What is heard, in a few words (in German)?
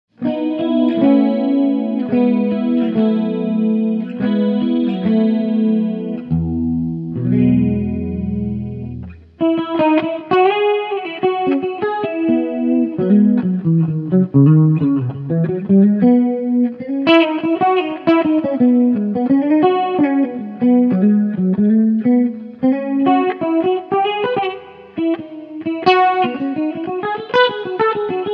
Halspickup Kanal 1 Klangregelung 12h Reverb Chorus